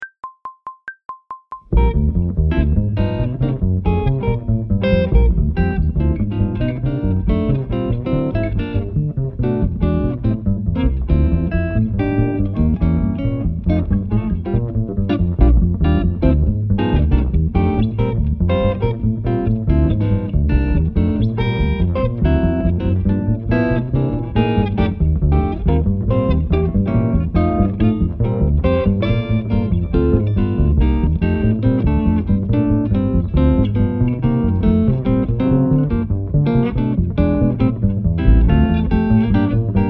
Very Fast Swing 280bpm, Brushes; Phrasing and Freedom with Brushes

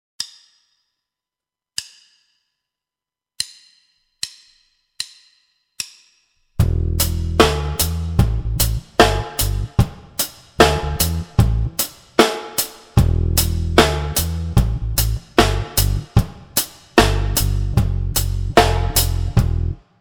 boof baff rockers, batterie reggae; Buchmann; Batterie Reggae VIbrations

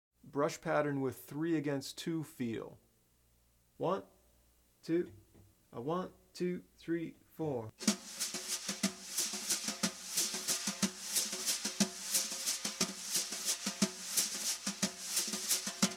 3 against 2, bop drumming; The Art Of Bop Drumming; Riley

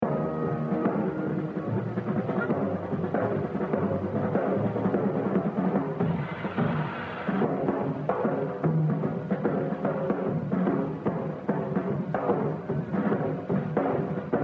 Jazz Drumming; Etude 5D Gene Krupa - 82%; The Evolution of Jazz Drumming (Gottlieb)

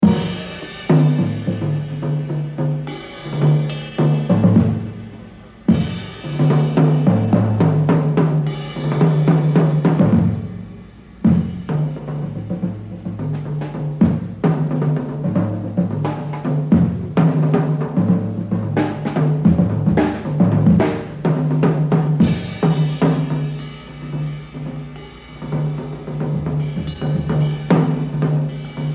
Solo, Jazz Drumming; Joe Morello; The Evolution of Jazz Drumming (Gottlieb)

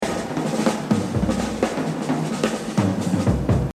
Exercise, jazz drumming; Exercise 23 Buddy Rich - 100; The Evolution of Jazz Drumming (Gottlieb)

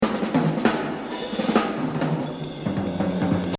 Jazz Drumming; Buddy Rich; The Evolution of Jazz Drumming (Gottlieb)

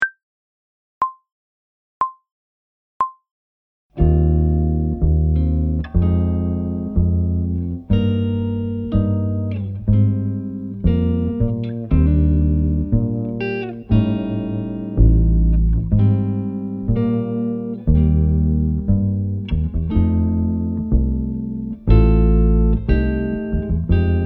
Ballad 60bpm, Brushes; Phrasing and Freedom with Brushes

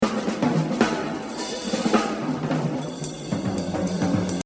Jazz Drumming; Buddy Rich; The Evolution of Jazz Drumming (Gottlieb)

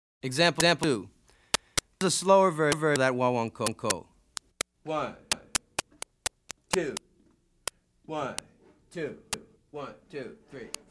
Afro-Cuban Grooves For Bass And Drums; Afro-Cuban Grooves For Bass And Drums (Goines, Ameen); Drummer version

